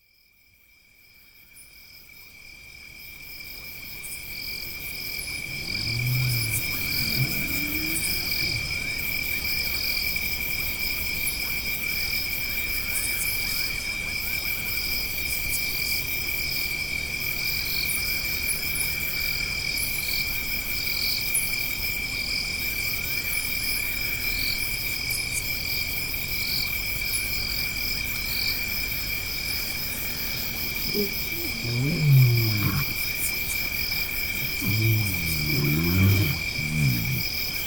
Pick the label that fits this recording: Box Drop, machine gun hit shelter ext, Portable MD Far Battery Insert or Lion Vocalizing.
Lion Vocalizing